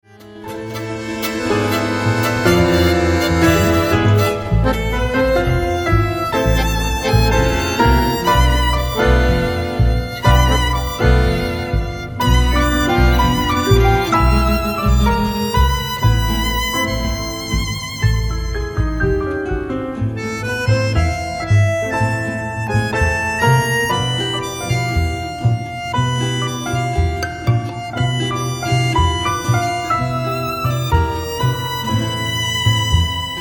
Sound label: tango